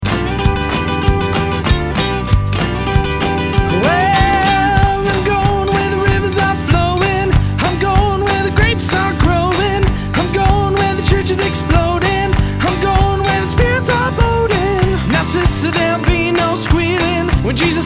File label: Gospel Feeling rock